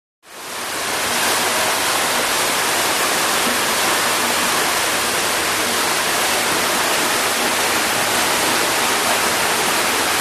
Rushing Water; Sewer; Water Rushing In Sewer Or Grotto.